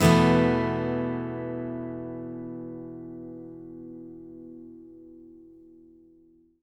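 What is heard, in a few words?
acoustic guitar E sus up, Whole Lotta Country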